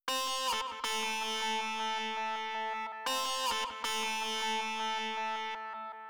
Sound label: Music Body Synth; Plants vs. Zombies Battle for Neighborville; GnomeGnight